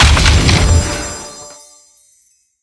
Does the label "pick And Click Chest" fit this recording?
Yes